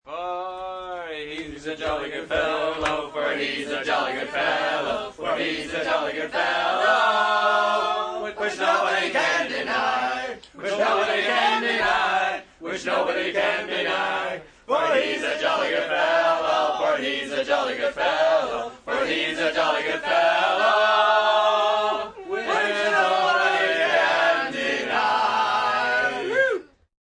Singing, song, group of 10 friends, 'For He's a Jolly Good Fellow'; occasion, event (human voice)(stereo)